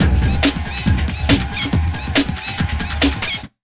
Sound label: Big Beat Drumloops